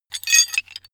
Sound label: metal handling pieces